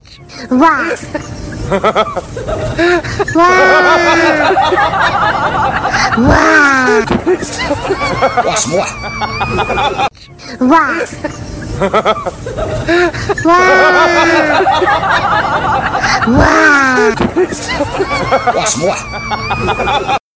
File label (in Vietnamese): tieng wah wow va cuoi; tiktok